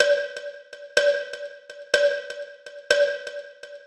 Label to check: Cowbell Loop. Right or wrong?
Right